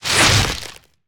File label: Web Sling Whoosh; Lurker; Evolve; Gorgon